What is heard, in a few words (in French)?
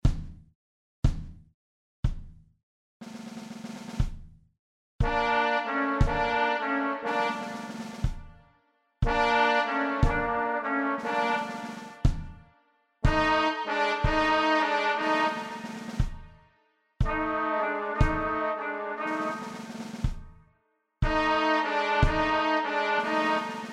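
batterie trombone trompette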